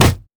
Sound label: basic attack; LoL